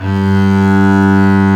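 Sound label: DOUBLE BASS G2; AKAI S-Series CD-ROM Sound Library VOL-2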